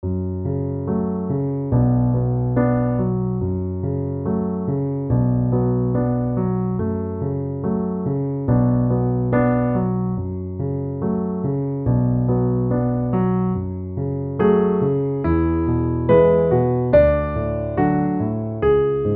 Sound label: Piano